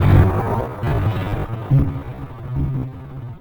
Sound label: Clicky Beat; Sonic Foundry (Sony Creative Software); Loop Noir